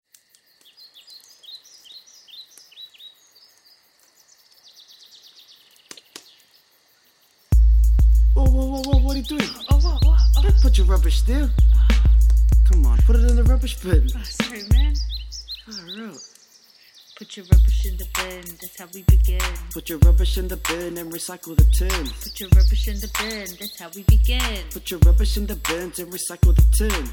rubbish rap

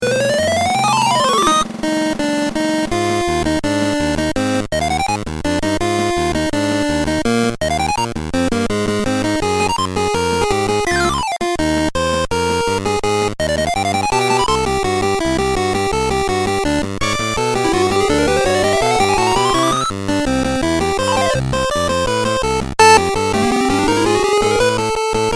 chiptune

